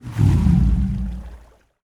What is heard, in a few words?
vehicle mediumboat turnoff